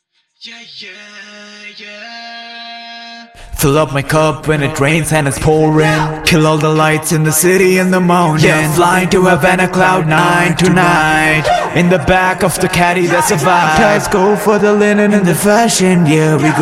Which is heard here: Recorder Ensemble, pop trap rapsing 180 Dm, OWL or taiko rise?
pop trap rapsing 180 Dm